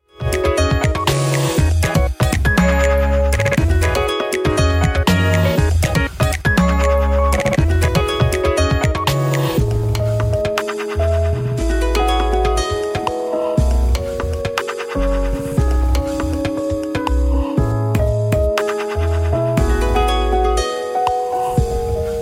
music box

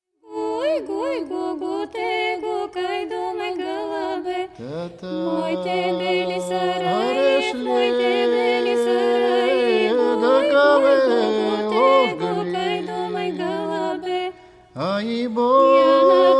Bulgarian voices; traditional

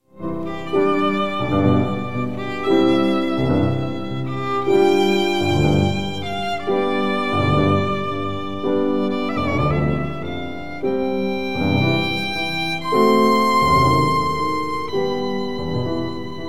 sonata for piano and violin in e flat; Ludwig van Beethoven; op 12 no 3

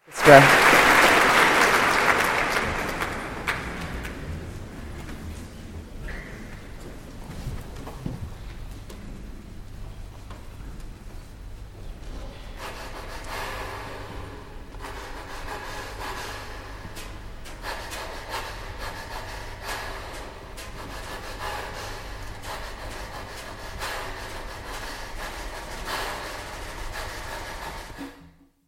singing; sally beamish

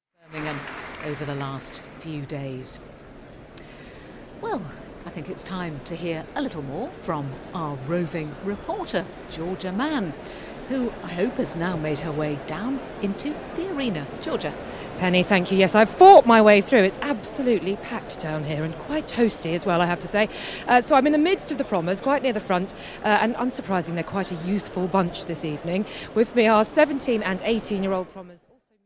piano concerto no 1 in d flat major; sergei sergeyevich prokofiev

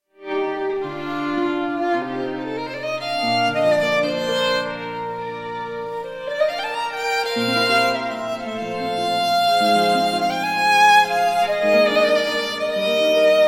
violin concerto, baroque orchestra; nardini; giuliano carmignola; venice baroque orchestra; andrea marcon; in g major

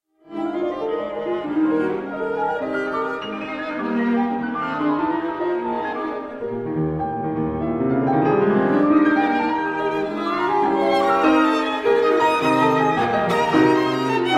piano quartet in c minor; Faure Quartet; Richard Strauss; op 13

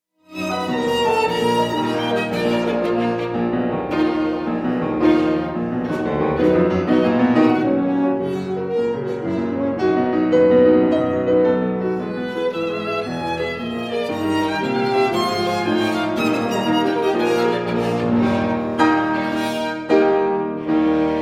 trio in e flat for horn violin and piano; op 40

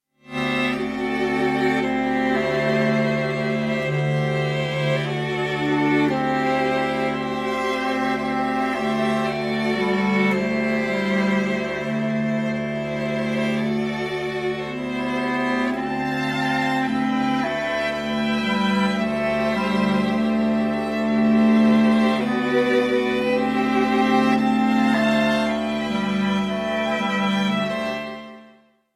bass clarinet and string quartet

